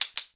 whip toot; Musical Instruments

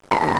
burp

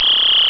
Guard Alarm; Ultima III

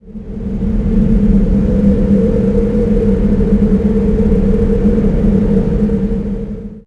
wind; PC Gamer IT CD 38; thief

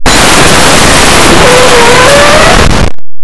door; Blue Heat

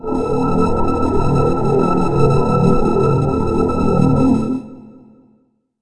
impact tune; Earth and Beyond; EA Games

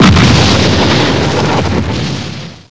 explosion

